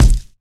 trebly kick drum e key, Royality free steel kick drum sample tuned to the E note; kick drum samples